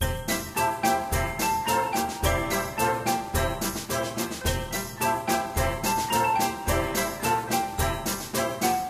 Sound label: Ballpark; Diner Dash Hometown Hero